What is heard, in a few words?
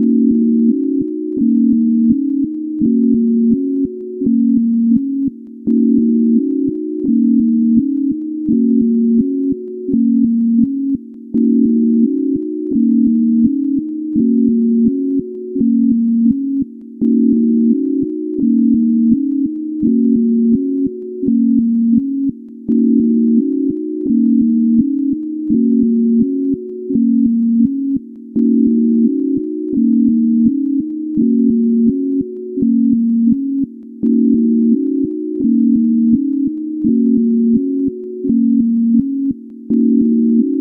Sinewave Suggestion